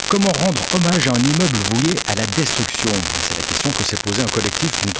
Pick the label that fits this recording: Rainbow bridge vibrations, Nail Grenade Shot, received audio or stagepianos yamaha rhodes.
received audio